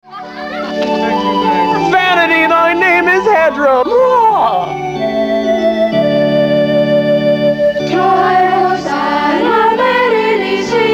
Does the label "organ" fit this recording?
No